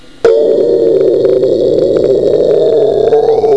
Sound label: WIND